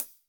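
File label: Cymbal Ambient, Drum Machines, Alesis D4; 300 Drum Machines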